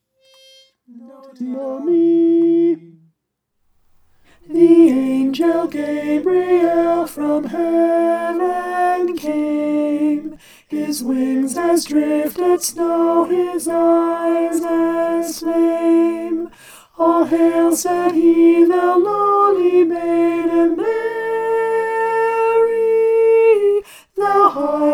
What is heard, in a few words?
alto